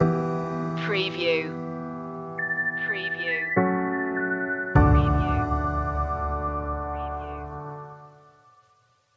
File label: will it rain stinger